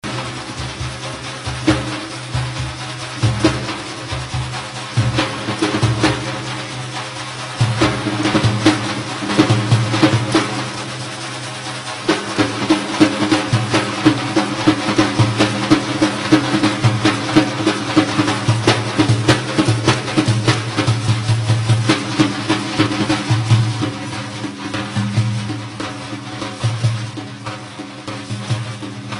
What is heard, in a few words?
daf